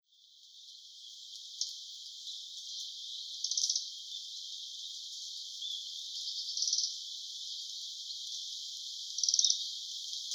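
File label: taiga flycatcher, utsunomiya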